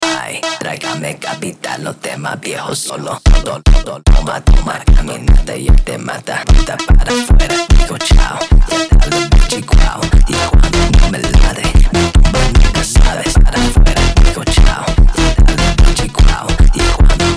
Sped Up

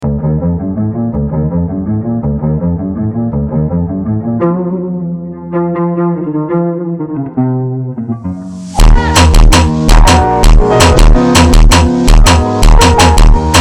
FUNK Super Slowed

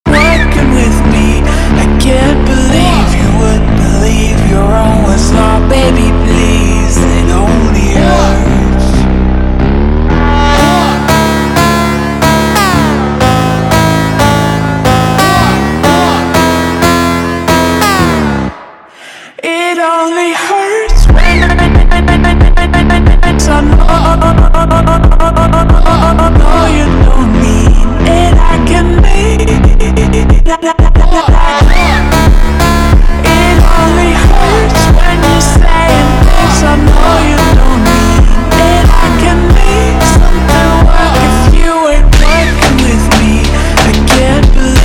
Super Slowed

